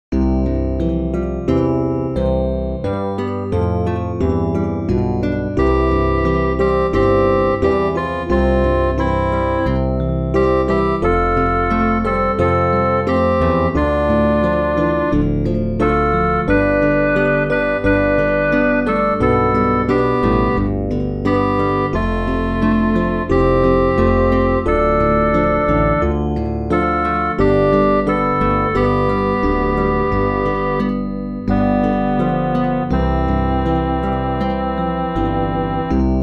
midi

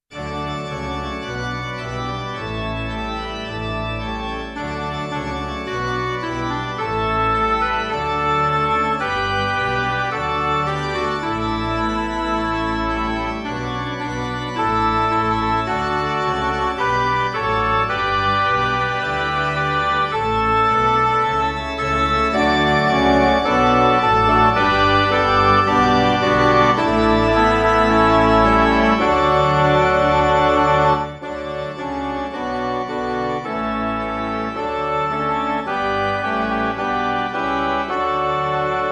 midi

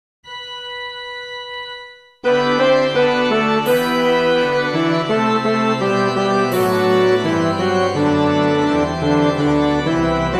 midi

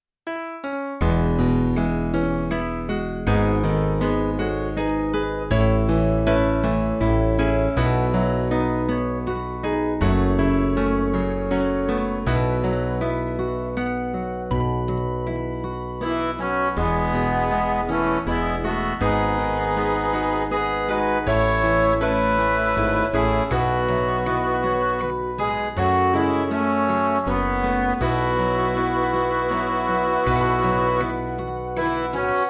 midi

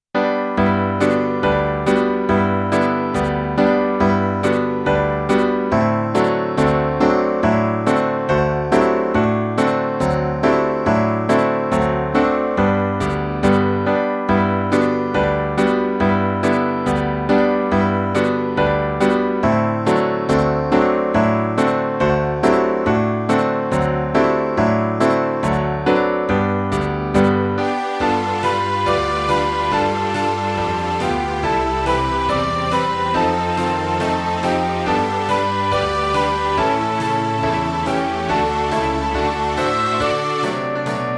midi

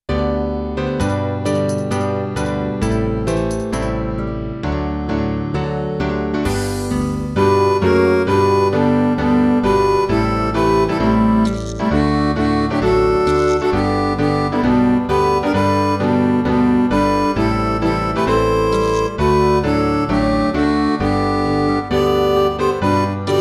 midi

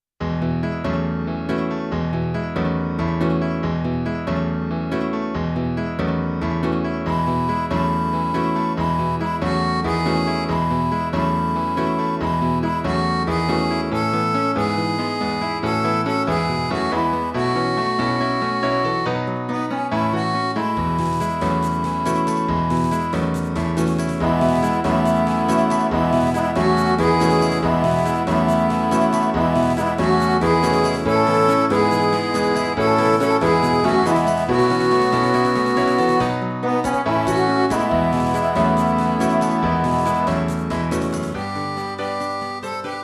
midi

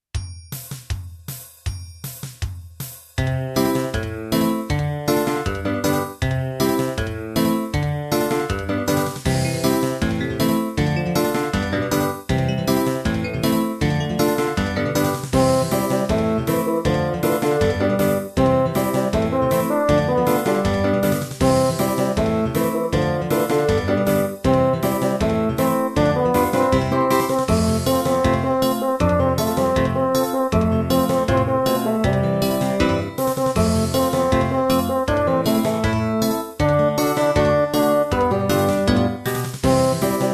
midi

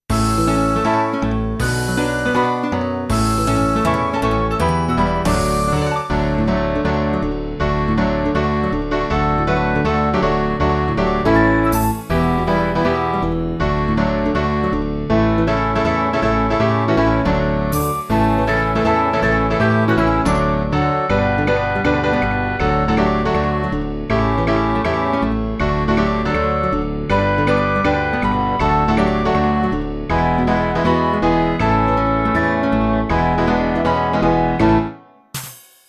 midi

